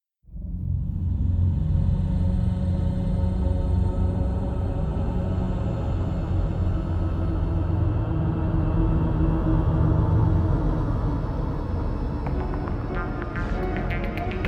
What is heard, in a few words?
Storm Shadow Intro Music, synth